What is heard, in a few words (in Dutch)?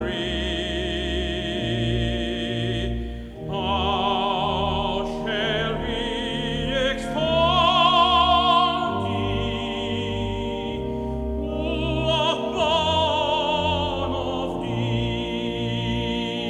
Koor (mannen); Mastreechter Staar